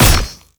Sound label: Projectile Impact; Ultimate Abilities; Madurai; Warframe; Focus